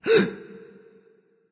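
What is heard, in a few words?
nonverbal breath; smite; voicepack; ullr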